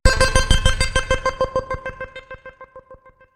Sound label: timer end